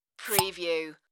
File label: open beer bottle